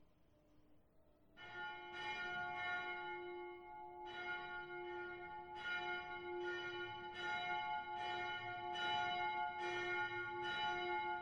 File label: bell south tower klemensmariahofbauer